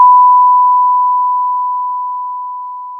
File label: Sound of a school bell